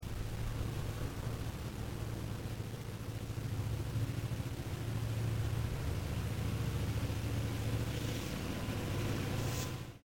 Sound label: Train